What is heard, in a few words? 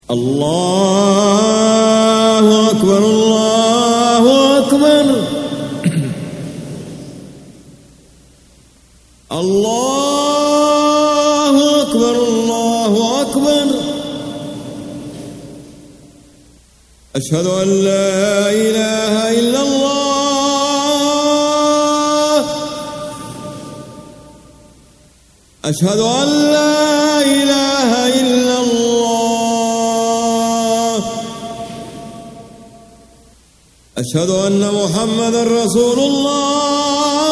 azan